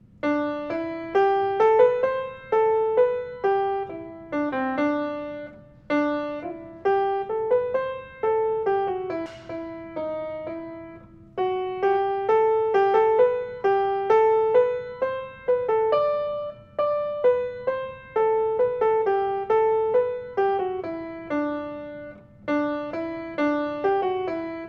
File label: MELODY; Blencathra